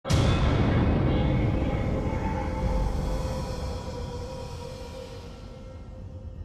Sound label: SHOCK; F.E.A.R. Perseus Mandate